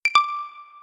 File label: notification